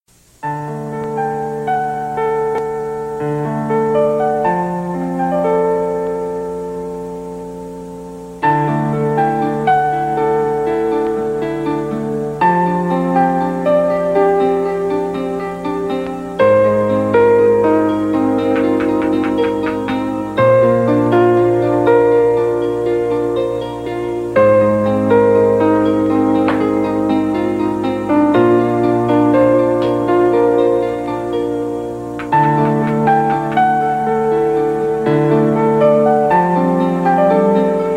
sad theme piano

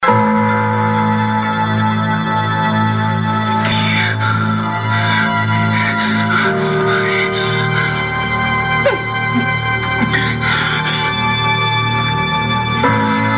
sad theme mourning

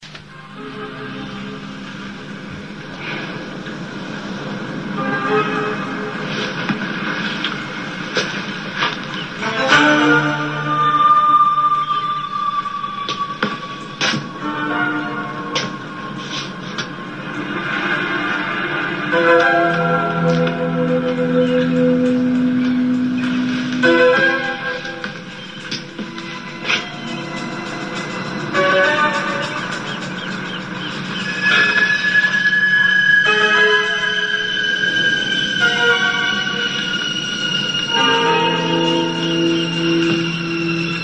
suspense

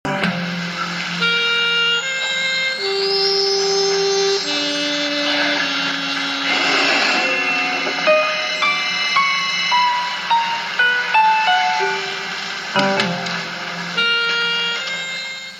Dramatic theme

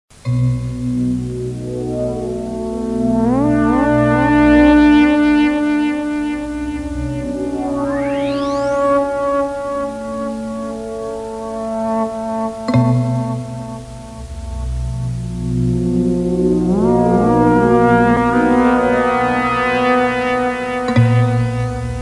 dramatic tunnel explosion; Shivers 6

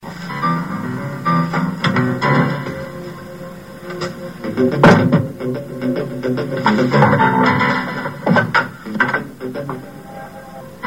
suspense

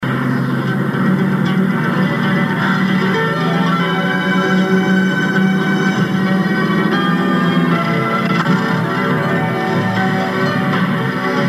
dramatic theme ender

